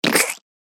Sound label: Yucky Slime Sound; Slimy; sound design